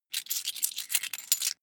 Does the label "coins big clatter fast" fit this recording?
Yes